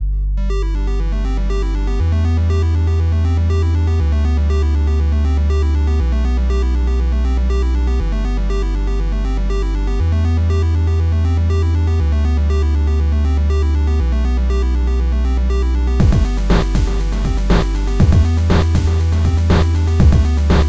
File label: famidrums